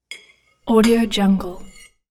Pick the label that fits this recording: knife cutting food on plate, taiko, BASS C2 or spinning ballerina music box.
knife cutting food on plate